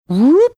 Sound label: mimicking sound text message being sent